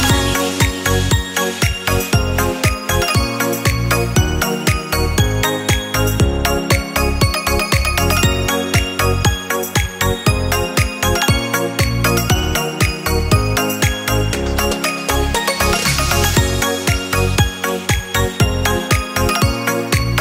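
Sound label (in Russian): pozitivnij kitajskij rington, Chinese ringtone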